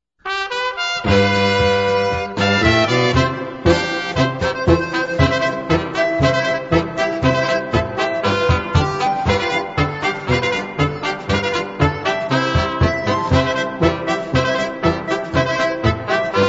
TRUMPET ECHO POLKA

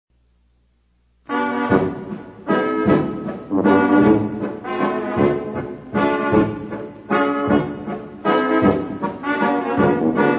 WALTZ

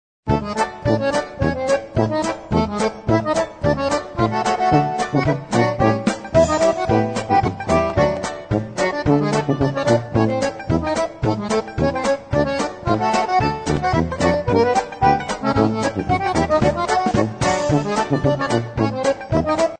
TOM CAT POLKA

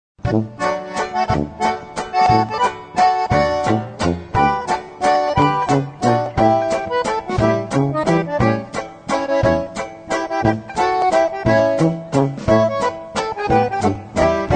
waltz

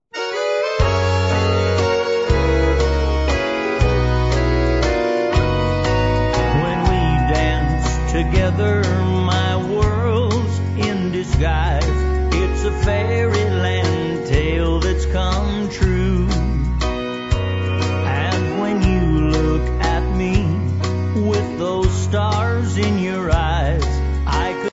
waltz

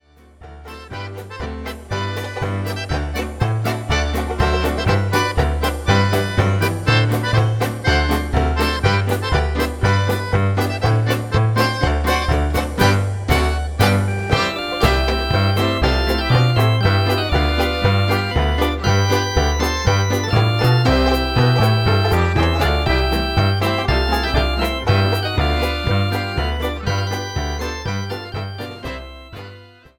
Flutophone Polka

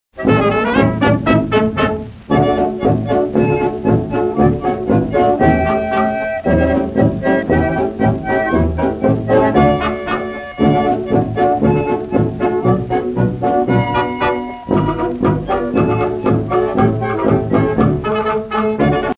CIOTKI ELLI POLKA

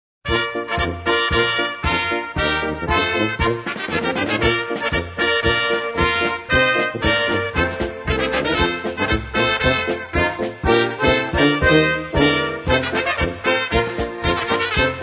polka

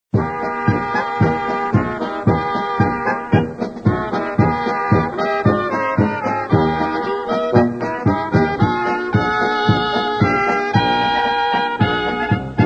polka

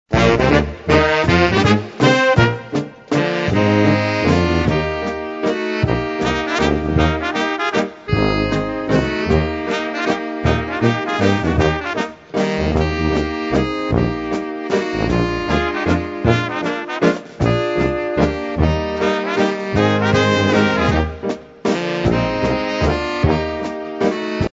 waltz

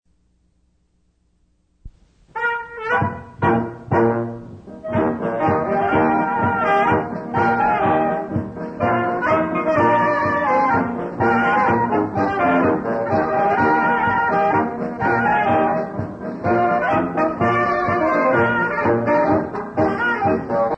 ROLLING STONE POLKA

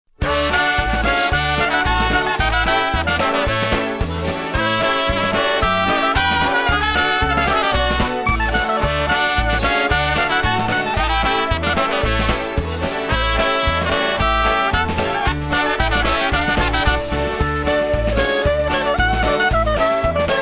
BASS POLKA

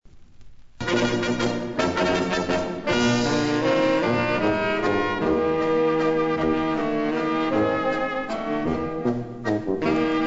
waltz

